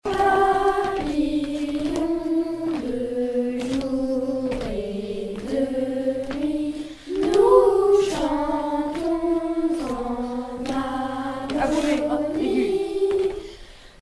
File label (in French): papillons basse